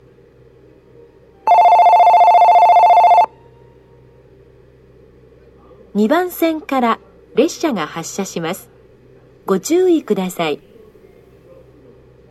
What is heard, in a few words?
kiyosu, station; jr-central